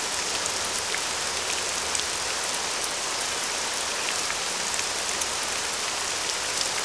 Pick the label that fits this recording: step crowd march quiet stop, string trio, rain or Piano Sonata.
rain